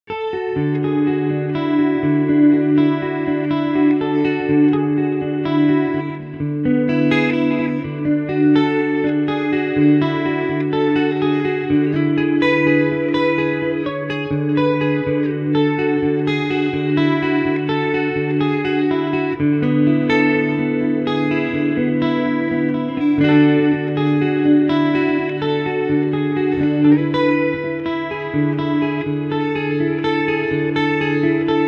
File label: Clean Delay Chorus